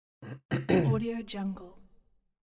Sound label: clean throat